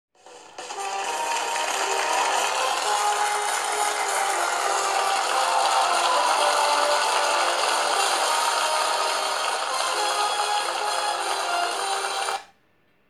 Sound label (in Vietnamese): nhac bong da; Toán 6 Chân trời sáng tạo